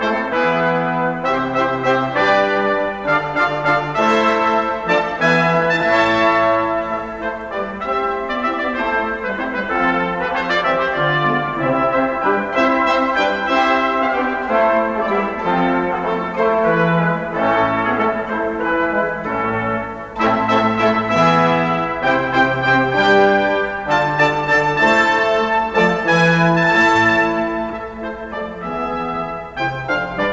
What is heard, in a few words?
classical